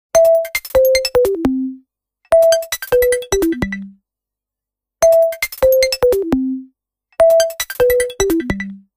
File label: Ring; Nokia Lumia-1320; Lumia 1320 Southeast Asia